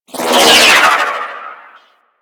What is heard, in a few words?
Arch Wing Prime Dodge Roll; Movement; Warframe; Vehicles